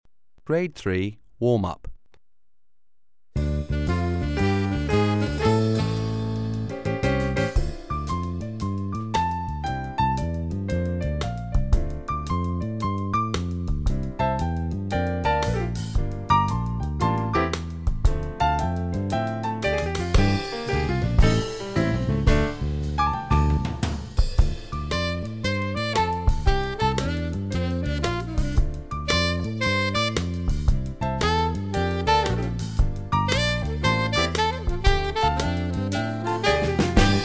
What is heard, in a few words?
Warm Up